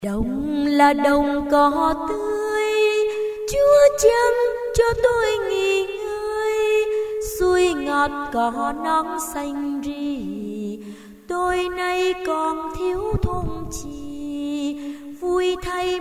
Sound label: sop alto